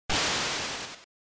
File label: General Noise Single